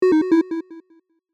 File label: classic game action negative